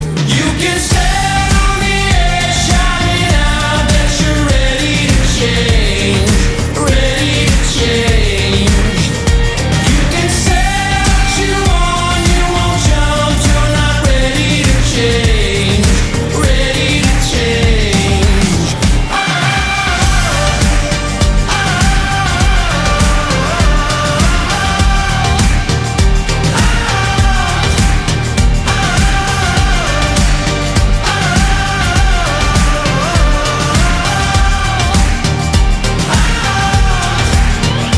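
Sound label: positive indie rock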